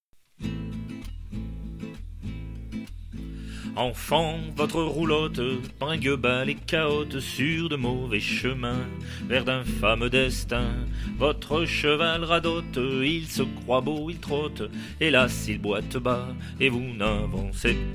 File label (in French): LES ENFANTS DU VOYAGE à 2 voix